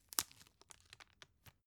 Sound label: tissue open soft